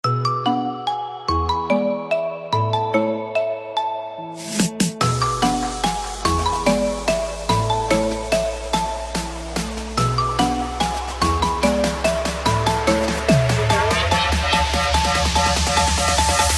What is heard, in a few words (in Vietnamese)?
Nhac Chuong Nokia Remix Tiktok